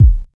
clear kick drum d sharp key, kickdrum sound tuned to the D# note. Loudest frequency: 83Hz; one shot